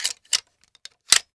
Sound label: Reloading begin; 完善武器音效; DungeonShooting; Godot